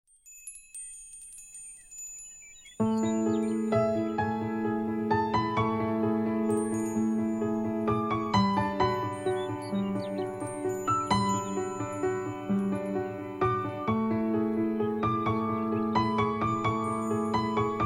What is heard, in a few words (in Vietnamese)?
khong loi xiaomi 11t gold